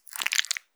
深めにストローク, ピストン運動 激しめ; １ストローク単発

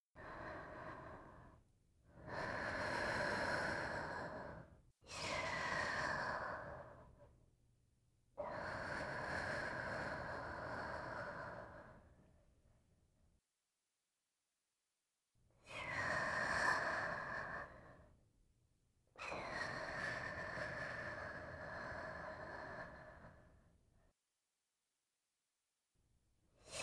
寝息ループ(寝言入り)

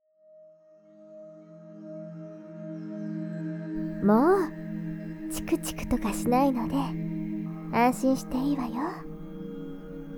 歯磨き・歯茎マッサージ, ASMR

